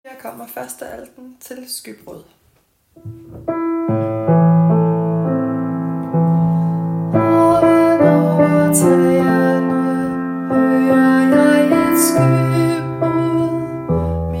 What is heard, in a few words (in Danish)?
2. alt